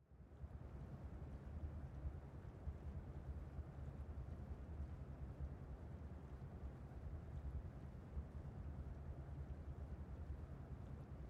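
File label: Ambience Boat Move Interior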